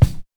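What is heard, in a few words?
KICK, DRUM KITS; InVision Interactive; 40 Oz Phat Slammin Hip Hop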